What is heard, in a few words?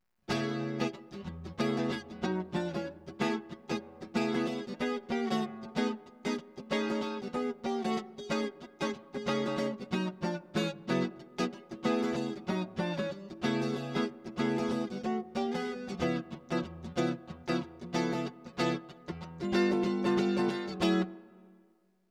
guit